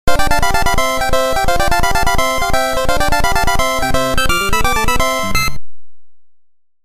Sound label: dance; kirby s dream land